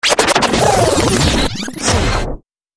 Rewind Alert

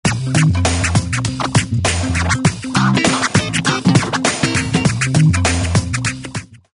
rumble in the funky trunk scratch

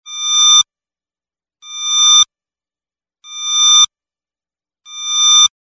radar high sweep

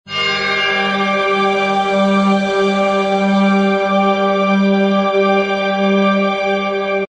fantasy chord extended bell

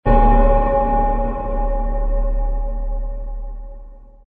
reverber bong

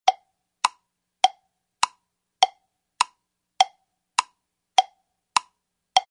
wood blocks ticking clock rhythm

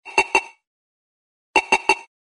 beep squawky triple signal

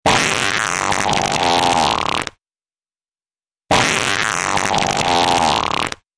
Morning Fart Alert

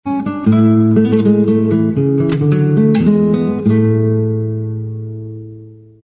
classical guitar accent mellow

